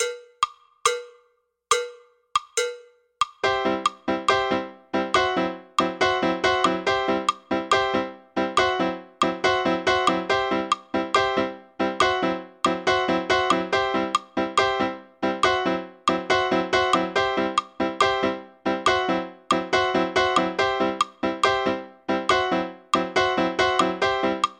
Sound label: tumbao fast